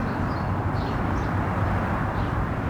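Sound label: shops ambience background; Tony Hawk's Project 8; XBOX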